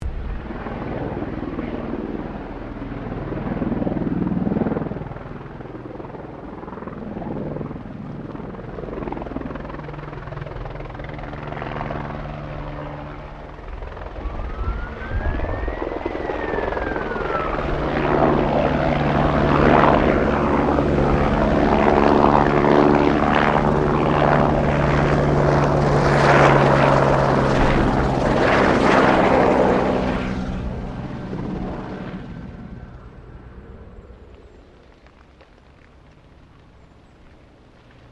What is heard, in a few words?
helicopter Bell 204 firefighter bombing run close; Helicopters; Aircraft Collection